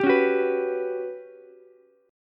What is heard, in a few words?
G Major 7th Flat 5th, Ukulele; GM7b5 strummed